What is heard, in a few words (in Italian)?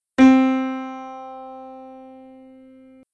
piano do4